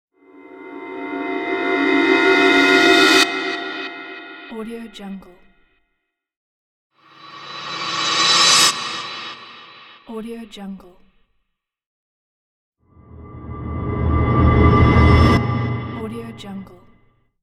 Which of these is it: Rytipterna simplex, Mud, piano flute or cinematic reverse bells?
cinematic reverse bells